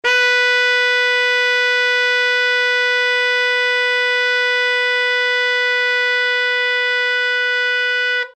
Ab2, saksofon; aplikatura; in Eb

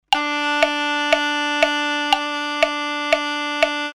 dziura b1, saksofon

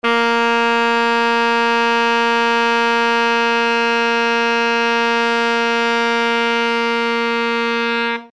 G1, saksofon; aplikatura; in Eb

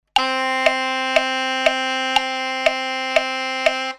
dziura a1, saksofon

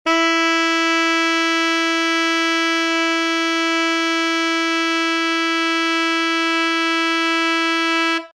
Db2, saksofon; aplikatura; in Eb

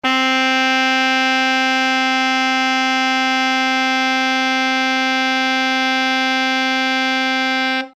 A1, saksofon; aplikatura; in Eb

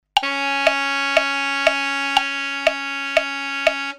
dziura bb 1, saksofon

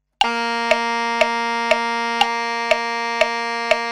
dziura g1, saksofon

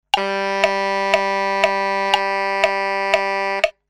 dziura e1, saksofon

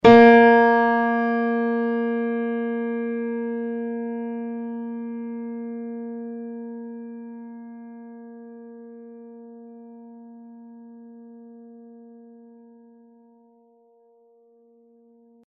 fortep bb2

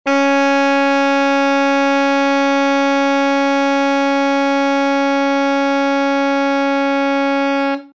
Bb1, saksofon; aplikatura; in Eb

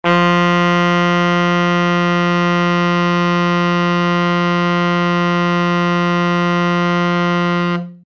D1, saksofon; aplikatura; in Eb

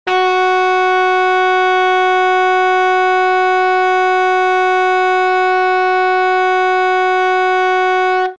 Eb2, saksofon; aplikatura; in Eb

